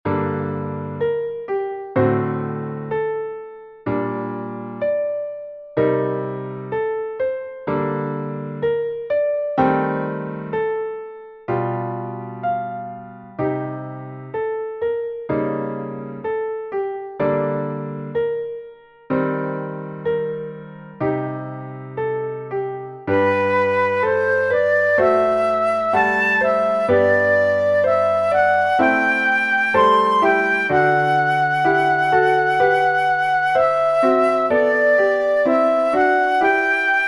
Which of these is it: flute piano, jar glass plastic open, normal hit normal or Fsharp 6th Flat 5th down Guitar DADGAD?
flute piano